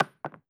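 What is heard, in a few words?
bullet case bounce 308 carpet; gmod; viper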